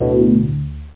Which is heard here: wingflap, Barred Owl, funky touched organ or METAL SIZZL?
funky touched organ